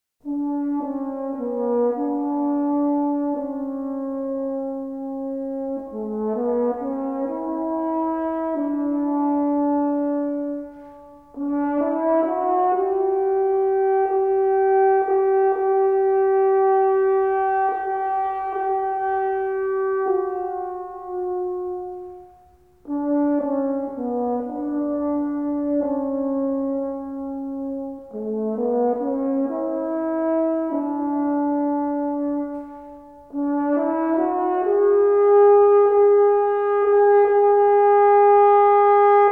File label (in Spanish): Sonido de trompa; Instrumentos de viento; Viento-metal